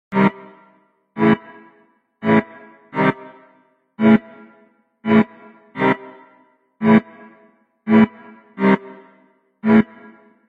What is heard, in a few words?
Goofy ahh beat